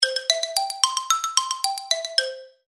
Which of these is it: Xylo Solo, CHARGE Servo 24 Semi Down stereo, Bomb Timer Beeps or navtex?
Xylo Solo